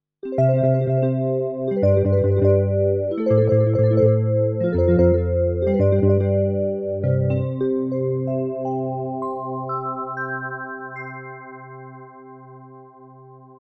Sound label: K150 FLUID VIB